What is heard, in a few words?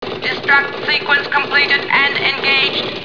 Comp destruct